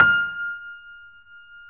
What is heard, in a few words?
F 5, Keyboards Acoustic, GRANDP ST; USB Soundscan vol.17